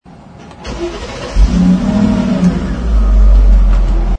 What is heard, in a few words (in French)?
SLR McLaren demarrage; mercedes